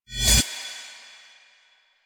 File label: Choir Eyes End; Warframe